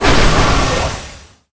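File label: Skill Leech Magic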